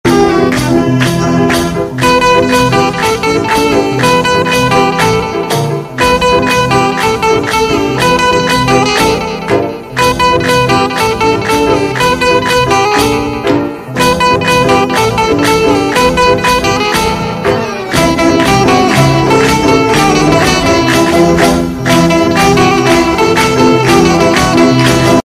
banjo ringtone animal song music ringtone

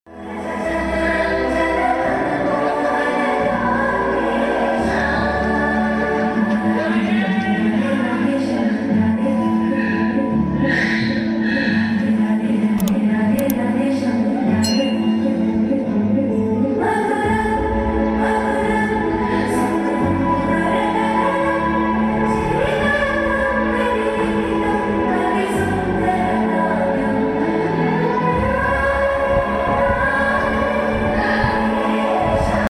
climax bgm ringtone; radhe shyam movie

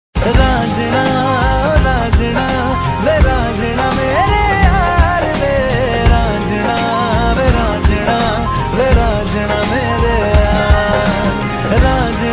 hindi song ringtone

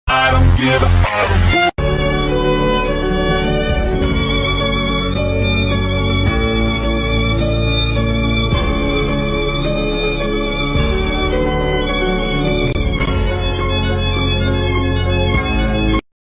playing the violin and flute; gacha life

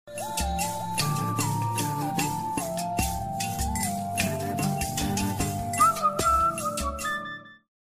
original full ringtone; reliance

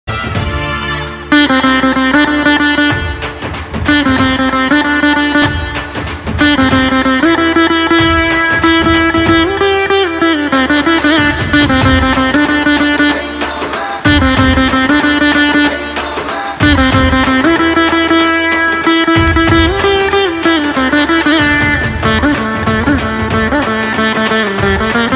music tambura veena; Gabbar Singh

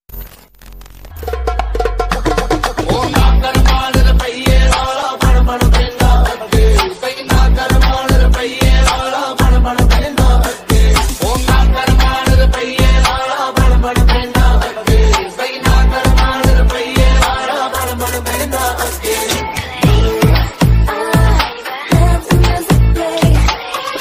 ringtone edm; roohi

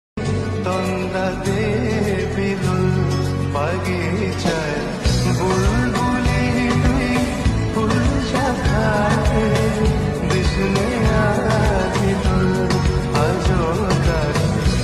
bangla lofi

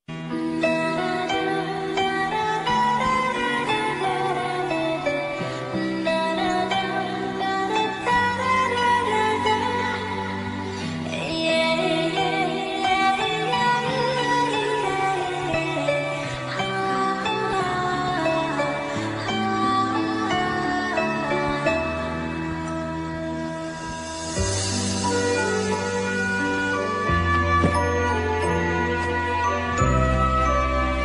love bgm instrumental bgm ringtone; paiya

